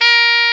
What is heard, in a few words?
SAX XSAX, Saxophones; All Saxes